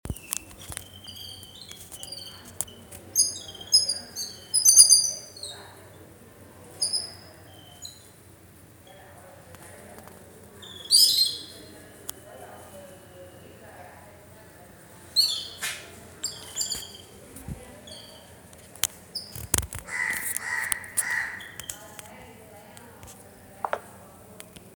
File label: yellow billed babbler